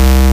Basses Root C

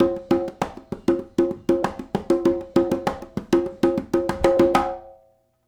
Smooth Groove Congas, 70s Analog Funk; Sonic Foundry (Sony Creative Software); What it Is!

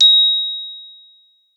A#5, GLOCKENSP; Xsample VOL-4

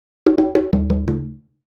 Conga Fill; Essential Percussion

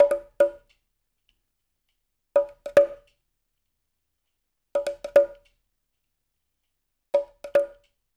moroccan; Spectrasonics; Liquid Grooves 1 & 2

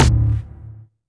Percussions

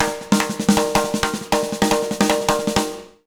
BEAT, Jazz Latin Drumloops; USB Soundscan vol.08; AKAI

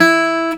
GTR SOFT E3, Celtic Flavours; USB Soundscan vol.42; AKAI

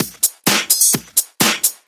Straight Loop 128 BPM, House

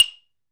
MARIMBA F 4; AKAI S-Series CD-ROM Sound Library VOL-7; MINI MARIMBA

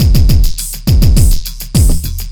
Processed Beat, Drums & Percussion

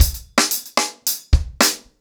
110BPM, ACOUSTIC DRUMS

